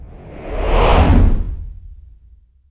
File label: power up respawn